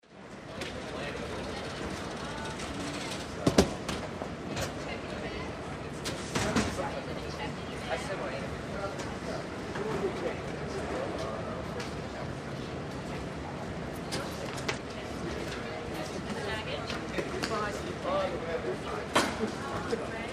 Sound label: Airport lobby, close to check - ins; British Airways Terminal J.F.K